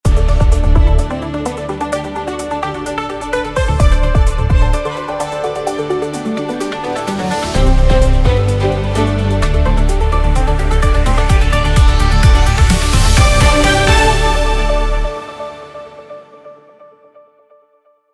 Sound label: Logo; Abendschau Original